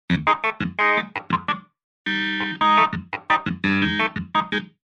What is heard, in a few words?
clavi